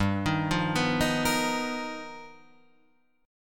G 7th Sharp 9th Sharp 5th, G, guitar chord; G7#9#5 arpeggiated; JGuitar

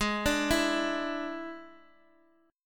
D Suspended 2nd Flat 5th Ab, chord

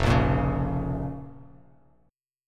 F Suspended 4th Sharp 5th Bass Standard, chord; strummed; 1,1,8,6; JGuitar

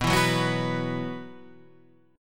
B Suspended 4th; Bsus4 Chord {7 7 4 4 7 7} strummed

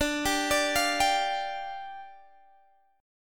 D Minor Double Flat 5th, down, Guitar, DADGAD

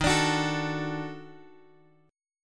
D sharp Minor Major 7th Flat 5th; D#mM7b5 Chord {X 6 X 7 7 5} strummed

